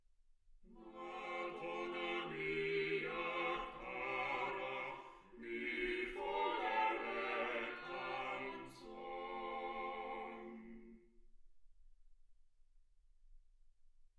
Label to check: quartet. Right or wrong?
Right